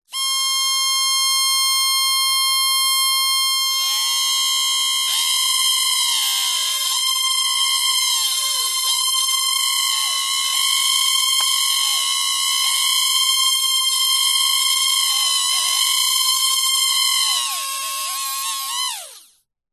drone micro motor no props whirr movement pitch fluctuations; vehicles